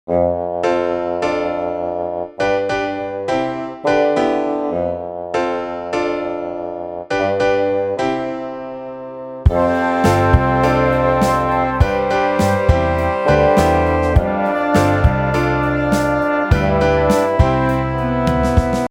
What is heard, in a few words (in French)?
Promenade Ringtone